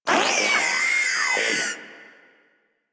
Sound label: Proto Infested Maggot Bark, Enemies, Vocals; Warframe